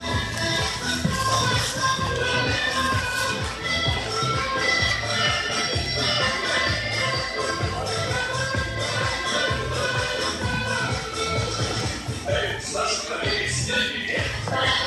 parque baile; rusia; moscu; tsaritsino

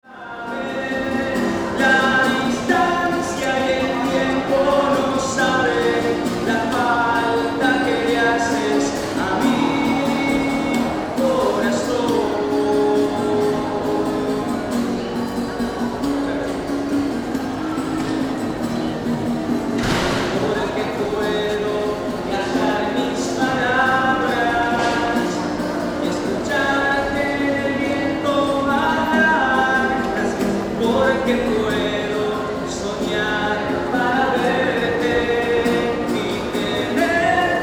calles musico en la peatonal; cordoba 950

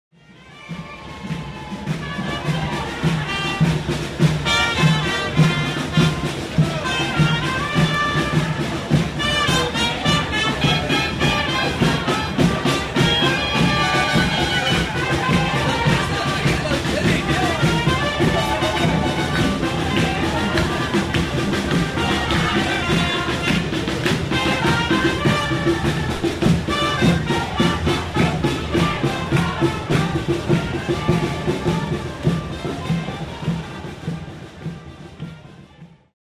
marcha 30 años golpe militar; san lorenzo y españa; Rosario

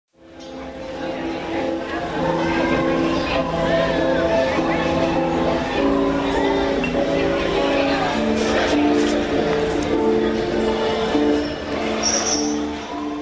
calles musica serrucho musicos en la peatonal

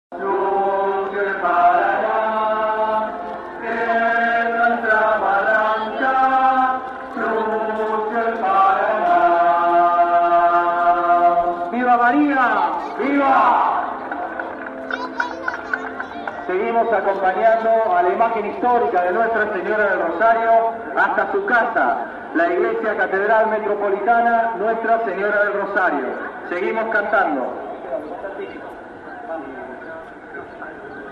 religion celebracion virgen del rosario plaza; dlcpa caggiano

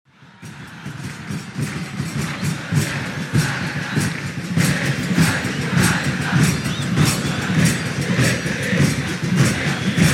futbol; tucuman; san miguel; ca san martin 2 vs godoy cruz 1

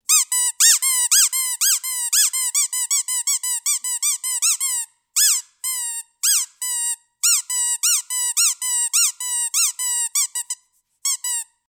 juguetes sonoros cerdita; peppa

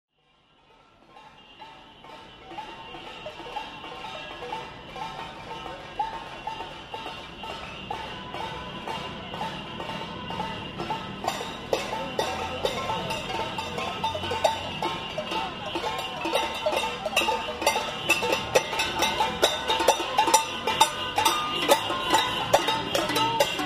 manifestaciones cacerolazo plaza

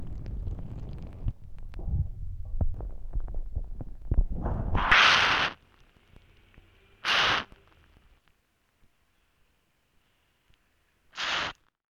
toma mate

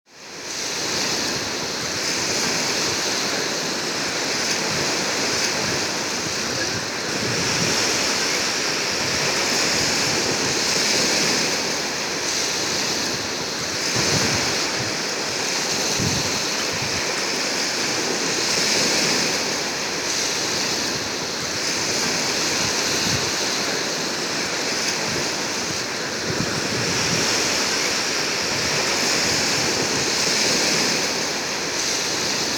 lago traful; neuquen

